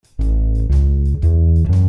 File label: bass